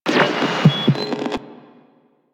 Radio Static Start C; DE; Warframe; Night Wave

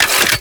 Mech End; Warframe; Weapons; Archwing; Arch Anti Aircraft Gun; Kuva Grattler

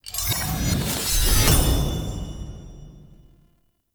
UI Crafting Claim

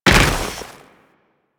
Combined Step Snow, Footsteps; Enemies; Warframe

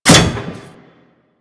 Ocean Piston; Ambience; Props; Warframe; Grineer Ocean

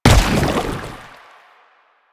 Step Default, Footsteps; Warframe; Lasrian; Lasrian Soldiers; Foley; Lasrian Chem Strike Nox

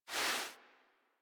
Foley Idle Paw Swipe; Enemies; Kubrow; Warframe

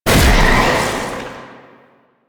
Void Launch; Weapons; Warframe; Duviri; Incarnon Adapters

